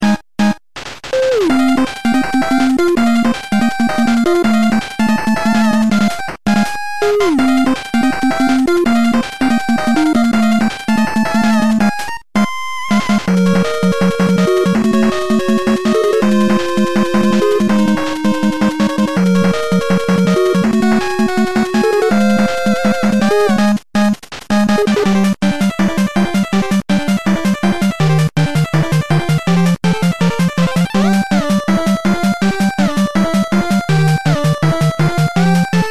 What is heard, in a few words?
turbo; rockman8